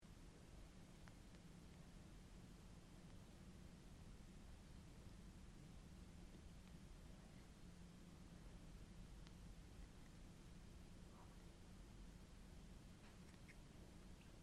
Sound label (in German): im schlossberg